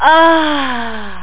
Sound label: Hit Brick; Pornoid